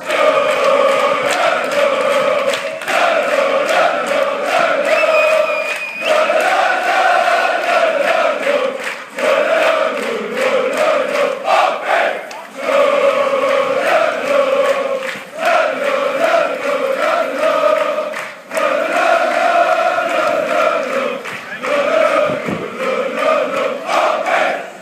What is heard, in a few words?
lololo, fan chants; aab